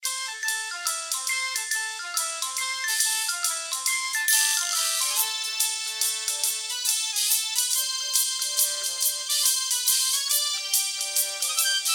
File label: Elevator Muzak; Mass Effect